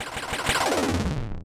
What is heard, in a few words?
weaken no echo